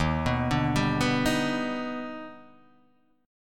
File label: D sharp Augmented Major 7th D sharp Guitar DADGAD